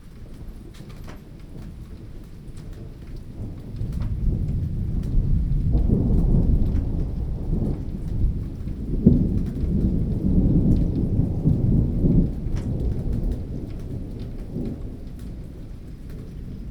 grondement pluie

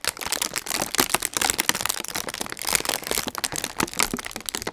crepitement

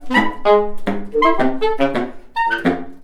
saxophone fou

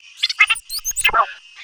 grenouille acceleree

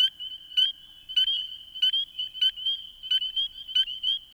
rainettes

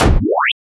biououip

